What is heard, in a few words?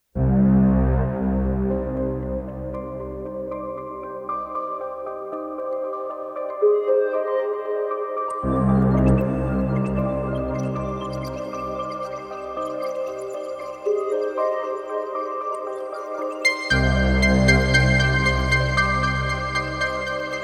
Music Ending